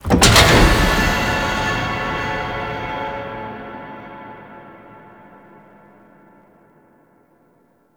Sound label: Stage Two Initiated, Ambience; Warframe; Grineer Asteroid; Kela Arena